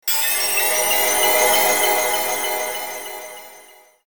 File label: magical; maoudamashii